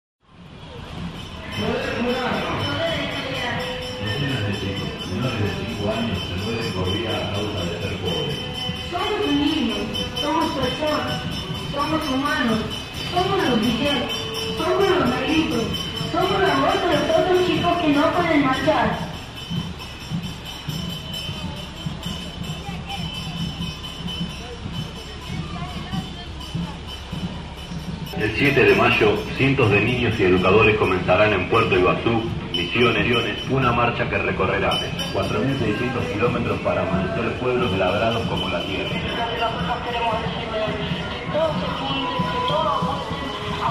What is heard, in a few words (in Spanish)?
marcha; chicos del pueblo; santa fe e italia